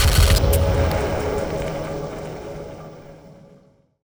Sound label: key pick; svencoop; rngremake; items